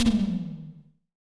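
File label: SDS TM, tom; Best Service; Real Mega Drums VOL-1; SDS V KIT 1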